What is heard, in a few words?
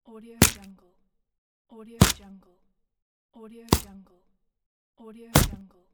punches